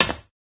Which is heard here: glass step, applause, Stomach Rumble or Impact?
glass step